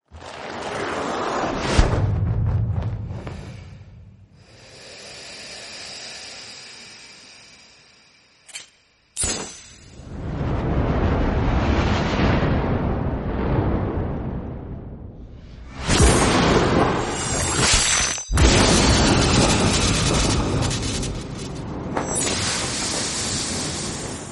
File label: breathing